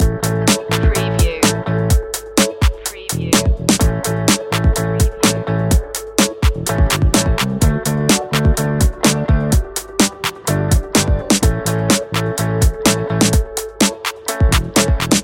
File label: groovy loop